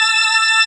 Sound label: SYN Organik; Best Service Gigapack 1